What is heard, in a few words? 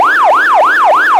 yelp, sirens; code3 banshee